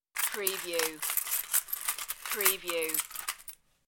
Coin Rattle

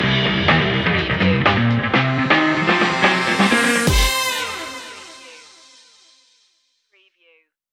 retro quest stinger

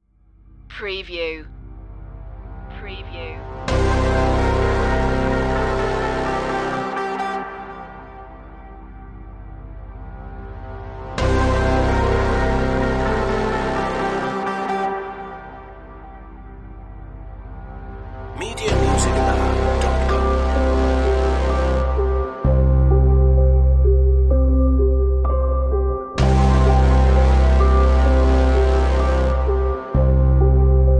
ready to rumble no drums or sound fx

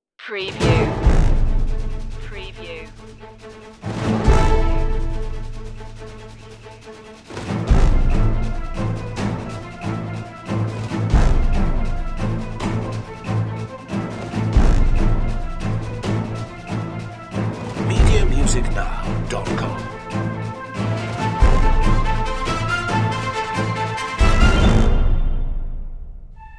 underground chase less strings and perc

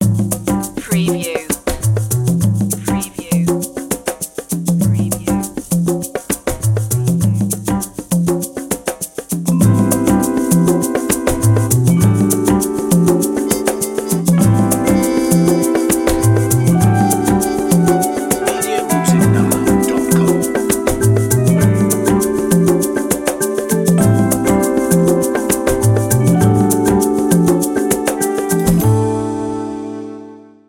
jazzy opener

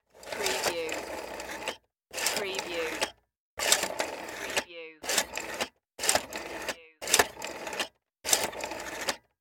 Telephone Rotary Dial

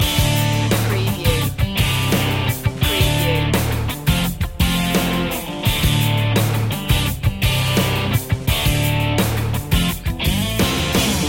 earth groove loop

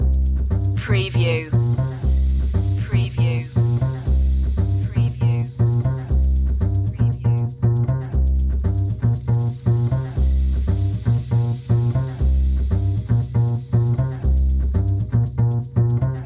english gangster flick loop

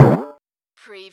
CARTOON BOING

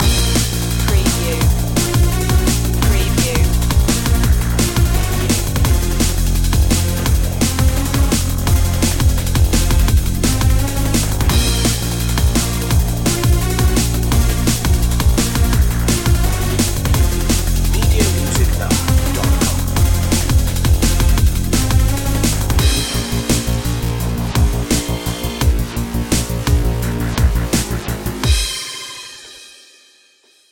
vital beats

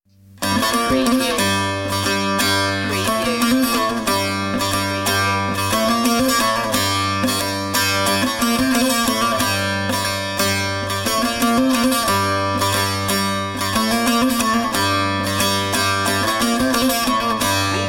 eastern ceremony saz only

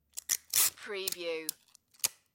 tape dispenser

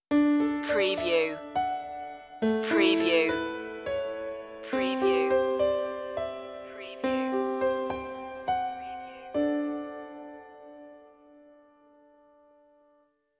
downtown groove stinger

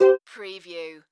Multimedia Chime

